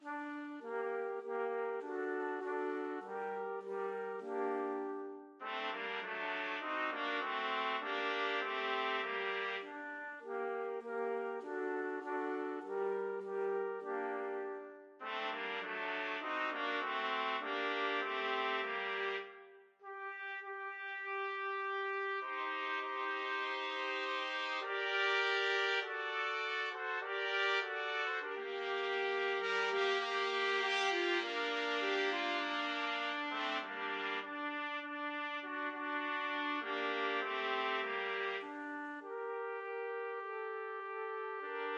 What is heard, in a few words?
go down moses trumpet trio